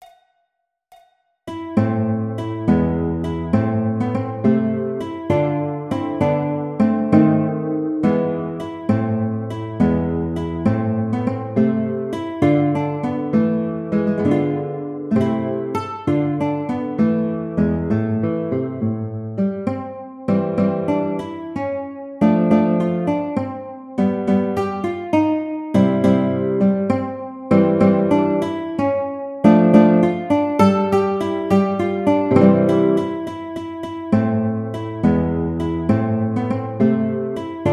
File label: Andantino Guitar, classical; Carulli Op 241 No 20